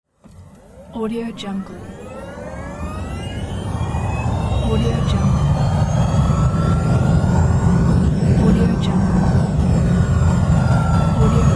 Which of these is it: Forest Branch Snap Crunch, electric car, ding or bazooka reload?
electric car